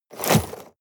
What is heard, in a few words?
shovel dig short